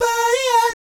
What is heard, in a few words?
BAYEEAT, Vocal Planet Jazz Fx; Spectrasonics